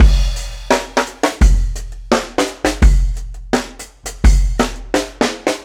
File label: Marching Kit 4-Bar, Breakbeats; KJ Sawka; Drum n Bass & Beyond